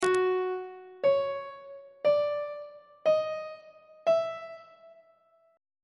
g flat dim6 min6 maj6 aug6 intervals on treble clef